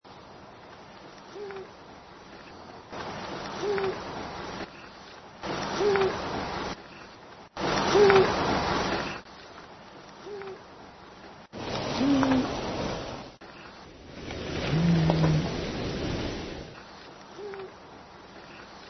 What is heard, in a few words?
owl prowl mystery call amped slowed; rockport